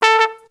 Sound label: Wind; 90 sSampleCDs; Coldcut's Kleptomania VOL1; Brass n Wind